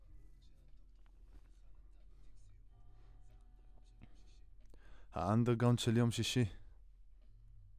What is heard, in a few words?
Voc OD